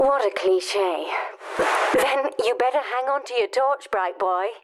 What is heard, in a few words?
Light Noise